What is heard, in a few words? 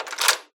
Doors door lock open; SFX; Environment; MONO; ProSoundCollection v1 3